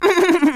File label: Laugh; Target Hungryfrogs; Emote Laugh